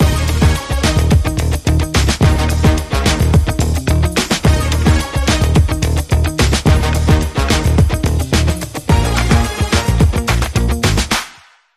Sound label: Swing